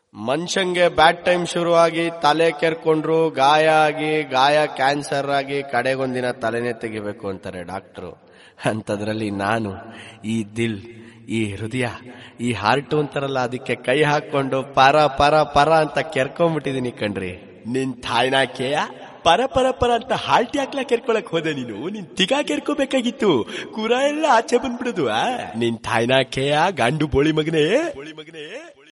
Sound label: kannada tune ringtone